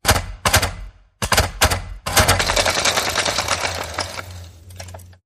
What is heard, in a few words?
Film Projector Broken Camera